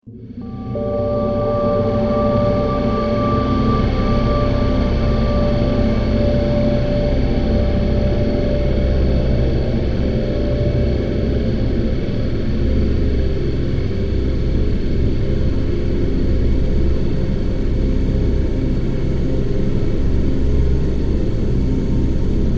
Space Tension, Ominous futuristic tension transition.; Sound Design